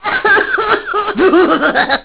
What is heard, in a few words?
cry laugh